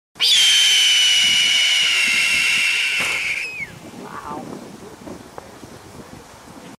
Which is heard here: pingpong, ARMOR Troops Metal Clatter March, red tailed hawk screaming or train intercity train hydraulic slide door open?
red tailed hawk screaming